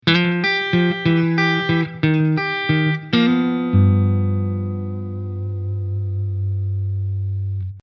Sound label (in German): Blues Licks Ende